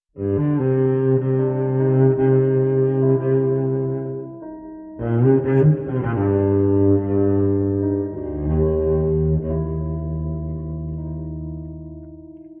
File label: keys, Loops, Sad